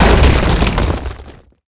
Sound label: Whoosh Stone ATK